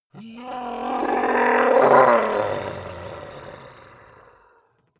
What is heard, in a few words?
monster dark growl; kreaturen